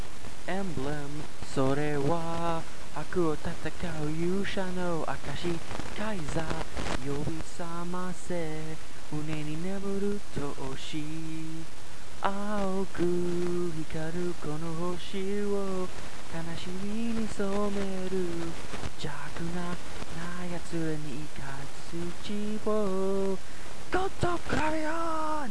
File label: Static; Gasshin God Gravion